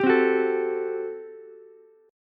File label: G Major 7th Suspended 2nd Suspended 4th G down Ukulele; GM7sus2sus4 strummed